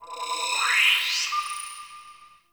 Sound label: Class Lesson Slide